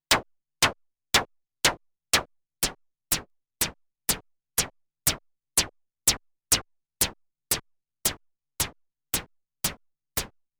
Snare SEM

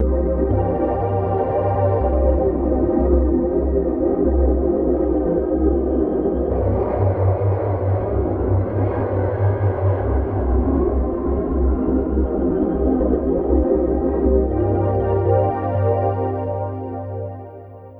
Quartet Chorus Ensemble Animated BBD; Reason Studios; Reason 11

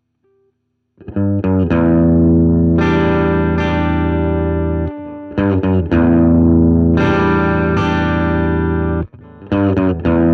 Fender Tele Plexi59 Tweed Cab; Antelope ZENQ

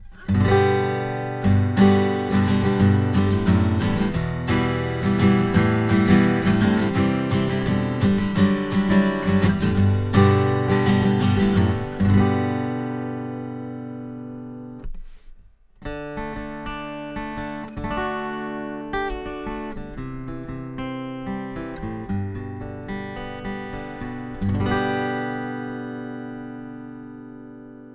DPA 4099 Acoustic Guitar 15th Fret

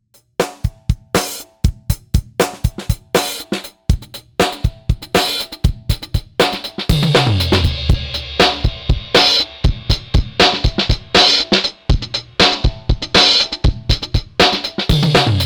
Drums Drive FX; Radikal Technologies EFFEXX RT1701

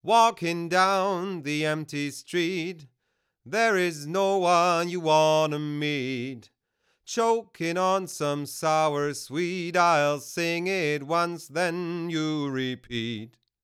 Vocal; Manultec MT-E.8012; ORCA BAY; ohne ORCA BAY

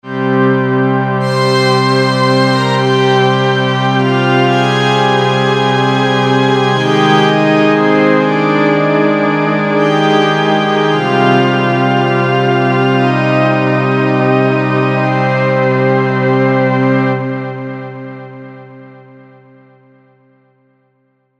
changin atmospheres

